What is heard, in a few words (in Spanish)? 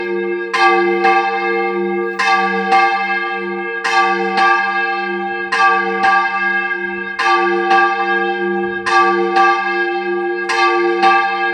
campanas iglesia; san pedro y san pablo quesada